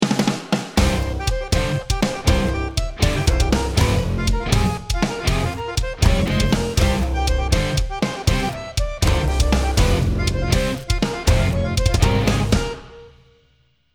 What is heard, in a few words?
start round, music